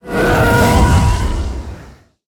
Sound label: Planet change Whooshes; lego star wars battles